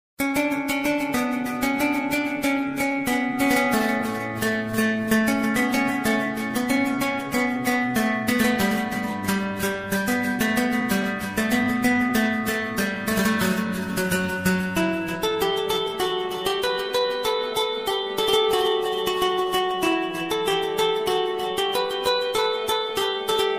ISLAMIC INSTRUMENTAL

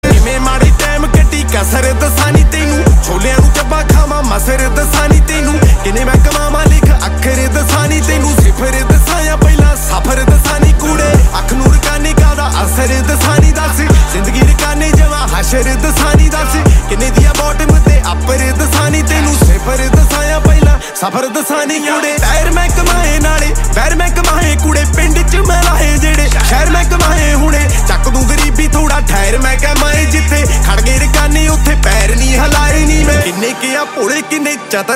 SONG RINGTONE, PUNJABI SONG

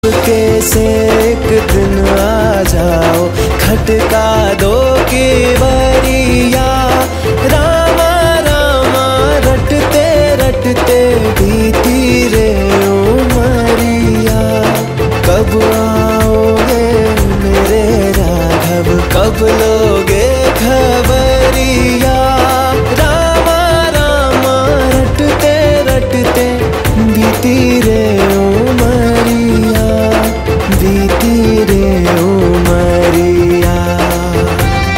SONG RINGTONE

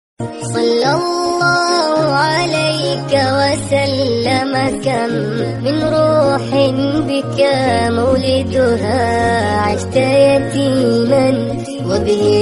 ARABIC ISLAMIC RINGTONE

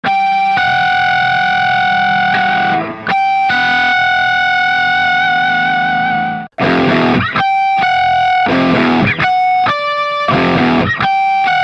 GUITAR ROCK RINGTONE

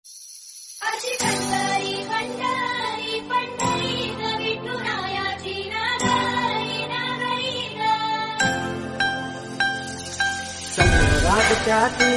MARATHI RINGTONE

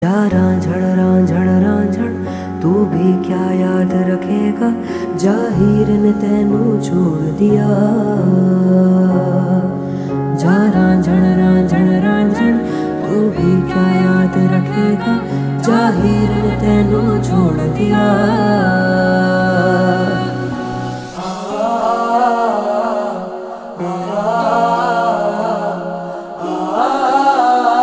RINGTONE, HINDI SONG

